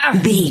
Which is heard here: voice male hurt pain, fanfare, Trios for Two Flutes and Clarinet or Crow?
voice male hurt pain